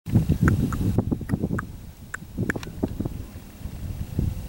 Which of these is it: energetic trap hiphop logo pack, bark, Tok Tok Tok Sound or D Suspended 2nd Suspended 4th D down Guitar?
Tok Tok Tok Sound